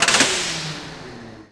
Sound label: lift down; Tony Hawk's Project 8; XBOX